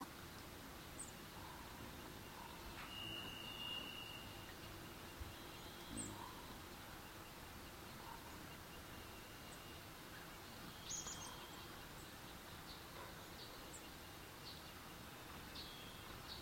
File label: Music BGM Outside Enviroment; Wenjia